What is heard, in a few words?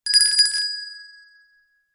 bell small hand ring short